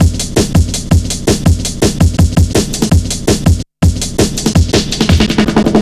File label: 165, Drum Loops, Drum & Bass; 90 s Sample CDs; Total Drum&Bass